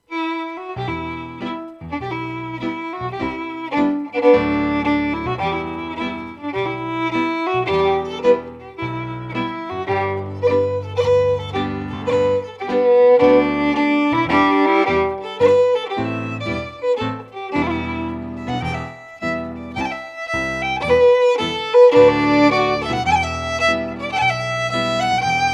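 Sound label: Reel, Scottish Fiddling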